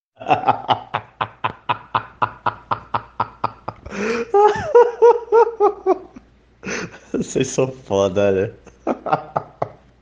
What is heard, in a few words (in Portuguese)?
risada